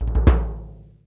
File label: ORC BASS ROLL; Greytsounds VOL-1; ORCH PERC 1